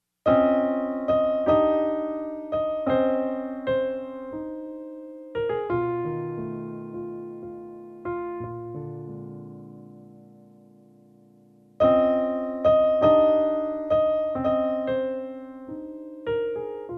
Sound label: indian flute